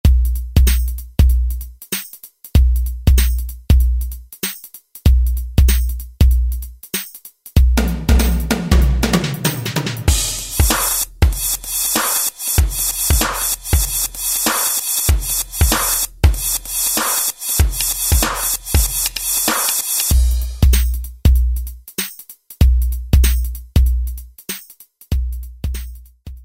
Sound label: Soft Electric, Alesis SR-16